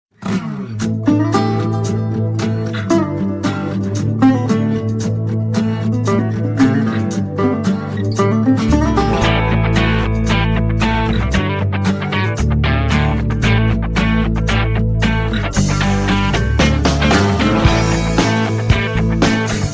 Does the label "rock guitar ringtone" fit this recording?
Yes